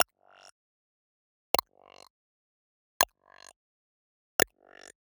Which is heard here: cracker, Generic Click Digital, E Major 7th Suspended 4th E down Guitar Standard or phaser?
Generic Click Digital